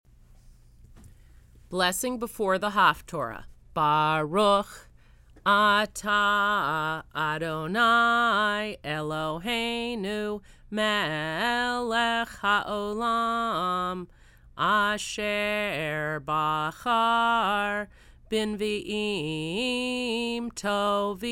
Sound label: Blessing Before Haftarah